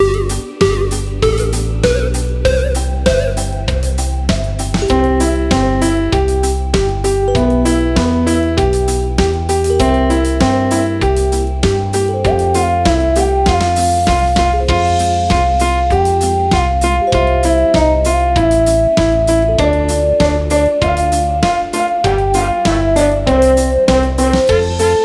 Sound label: karaoke